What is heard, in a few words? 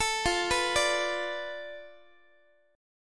F 6th Flat 5th F down Guitar, chord; F6b5 arpeggiated; x,x,12,15,15,13